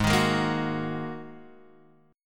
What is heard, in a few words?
Ab 7th Suspended 2nd Ab, Guitar Half Step Down